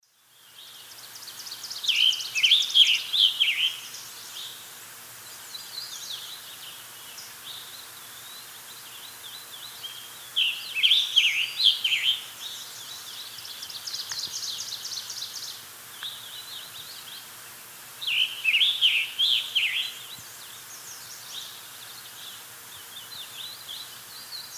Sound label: Piranga olivacea, song; S. America; Eastern Lowlands